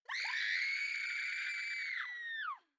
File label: Two Voices Screaming Female No Effects